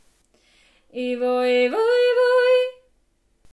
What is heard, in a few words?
Yodel